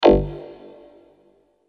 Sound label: error